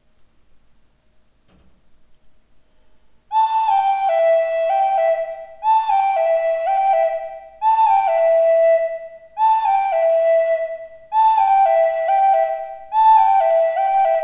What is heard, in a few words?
pans melody, native american flute